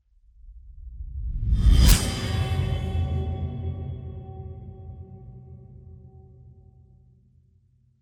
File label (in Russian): zvuk rassechenija; Короткие звуки для монтажа — для transition, движения, смены кадра, звуки для выхода эффек